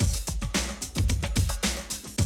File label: Classic DrumLoops, 110